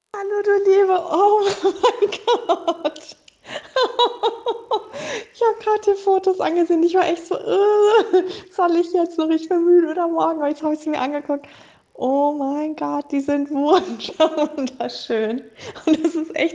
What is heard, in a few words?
feedback audio vocals